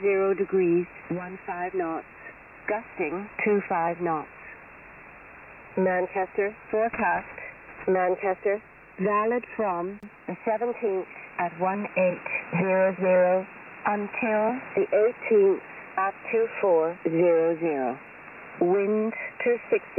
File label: 3413khz USB 2.7khz, Kurzwelle; Shannon Volmet; Arinst Dreamkit V2D; Auch auf der Kurzwelle war "reziprokes Mischen" zu bemerken, sobald sich starke Signalpegel in der nähe der Empfangsfrequenz befanden.